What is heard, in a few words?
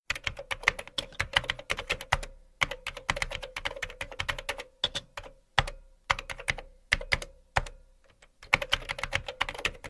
keyboard typing sound effect